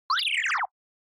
Motion Pop

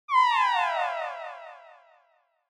Negative Low Long; Onoma; オノマトペ ネガティブ; 低 長

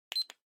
Stopwatch Digital Start Stop Gentle

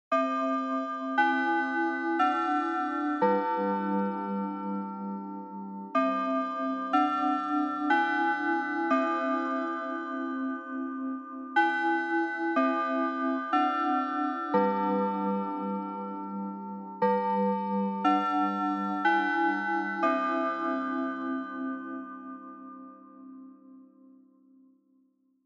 Japanese School Bell Slow Long

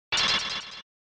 SNES Fighting Glass

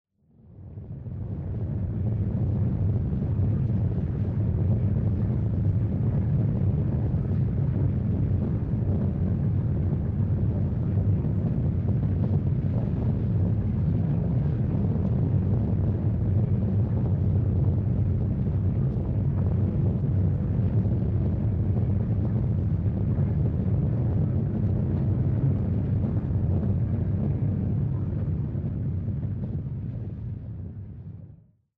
Onoma Rumble Gentle

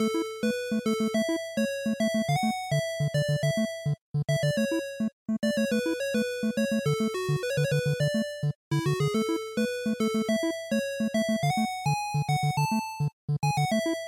General Stage Loop, bgm

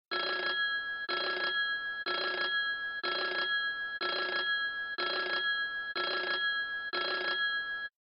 OS Sound Ring

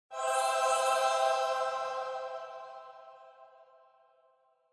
Angel Short Bell

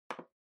Trackpad Click Far R

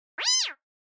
Cat Synthetic

